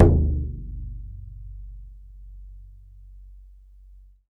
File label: BODHRAN, Celtic Percussion; Drums&Percussion; E-MU Producer Series Vol. 7 – Old World Instruments